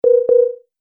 Multimedia Error, beep; Business Programs, Apps And Computing Noises; Andrew Fleming

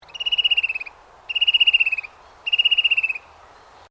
Bird Fan Tailed Cuckoo Call, Birds in Queensland; Animals

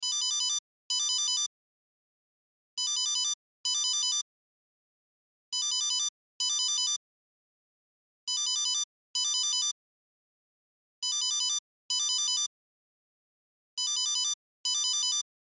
Cell Ringtone; Loop; Cell Phones; Blastwave

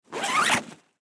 Lacrosse Equipment; SPORTS; Blastwave

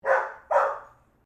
Animal Dog Golden Retriever Bark; Various domestic dogs; Dog Sound Effects; Dogs (Sound Effects:Animals); Foundation1

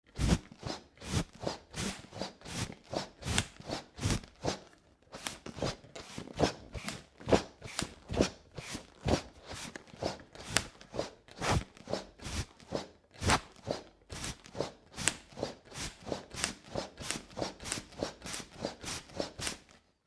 Bellows Pumping Air - hand held fire bellows; Machinery; Machines

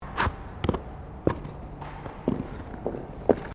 Iceskating Step Maneouvering, Figure skating; Sports

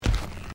Footsteps Carpet Step; Crocs; Footstep Foley; Footsteps (Sound Effects:Human)

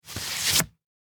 Vinyl Record Remove From Sleeve, paper; Technology; Materials

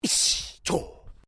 Male Vocalization "Hun Cha"; Male vocal sounds; Exclamations (Sound Effects:Voice)

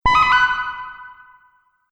Multimedia Correct, beep

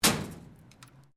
Metal Plate Impact; Materials; Foundation

